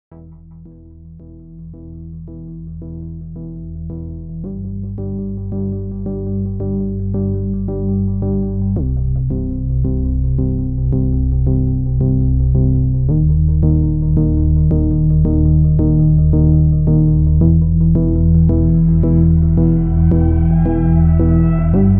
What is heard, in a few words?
vintage synth; LANDR